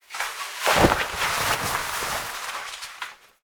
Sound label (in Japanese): 服を脱ぐ音